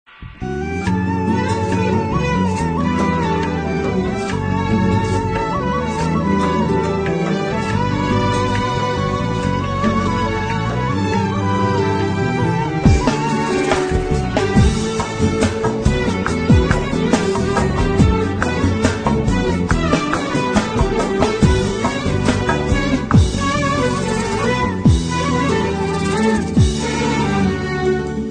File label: slow and sad music